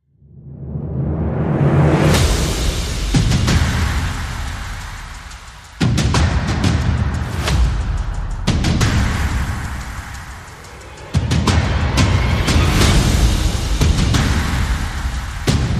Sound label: Percussion and Effects